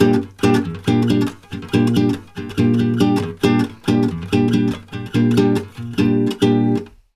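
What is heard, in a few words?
bossa Bb, 140 major chord; USB Soundscan; AKAI; Brazil Bossa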